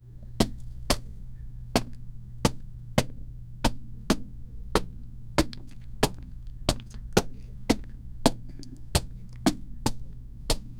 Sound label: beatboxing softly muffled